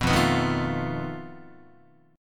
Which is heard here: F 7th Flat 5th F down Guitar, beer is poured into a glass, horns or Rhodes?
F 7th Flat 5th F down Guitar